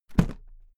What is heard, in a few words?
briefcase drop close; industrie wirtschaft; bueroarbeit